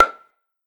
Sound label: Practice Pad